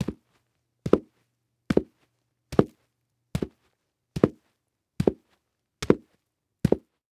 Foley Footstep Cowboy Boots Dirt Debris Waking Short; Foley Footsteps